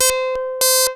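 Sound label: sample and hold C5; Best Service Dream Experience; S+HOLD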